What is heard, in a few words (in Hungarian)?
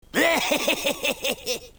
Hehehe; South Park; Venezuelai